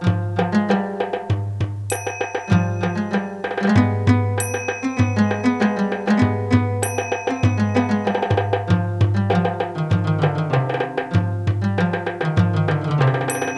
MUSIK; MOBICLIC 84

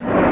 Schublade retur; tivola; max1demo

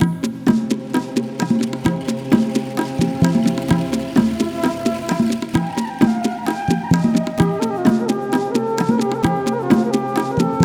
MUSIK; MOBICLIC 89

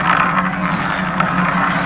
roulette Kugel; Amiga-CD - Volume 2; dolbytest

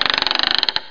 ratsch; CD-ROM Magazin 1996 September

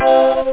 E-Orgel; workshop

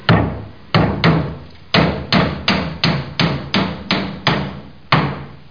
nagel

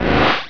elektro

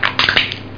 nuss

